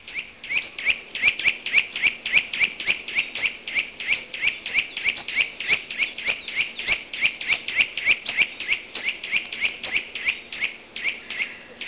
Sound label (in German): kleiber; park; voegel